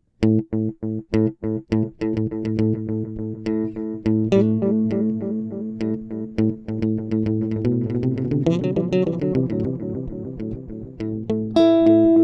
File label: DM 2w Standart Mode